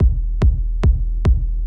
Music (Gameplay State Neutral); Music Hideout2; H2 New Level Area=Neon Corridor

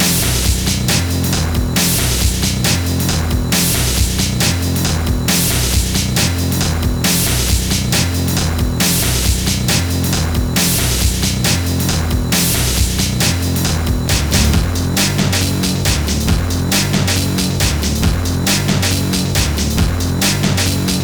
Fight

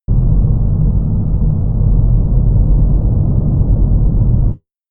Shockwave; Universe Sandbox 2

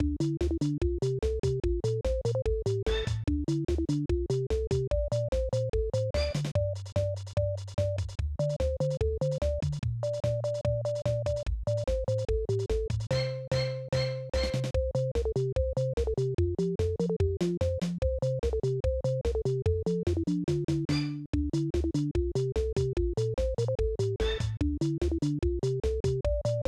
Level Music; Clock Works; SNES

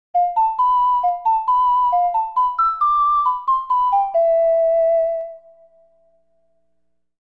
Ocarina ''''; The Legend of Zelda Ocarina of Time 3D; 3DS

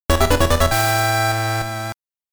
Victory; Ganbare Goemon Sound Treasure Box

